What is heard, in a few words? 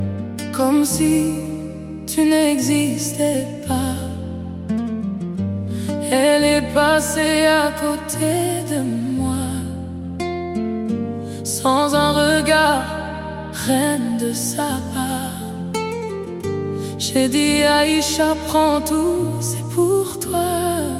Epic Orchestra Version